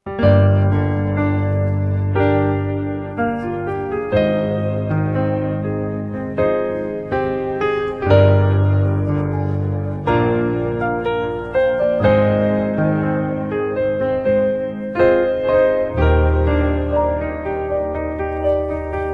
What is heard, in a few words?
piano Version